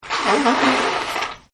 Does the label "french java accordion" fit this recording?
No